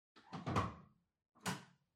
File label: Washer Front Load Far Detergent Drawer Close